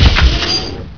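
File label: slide door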